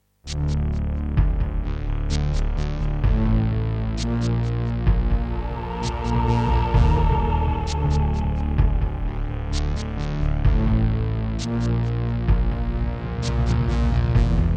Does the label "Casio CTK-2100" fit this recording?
Yes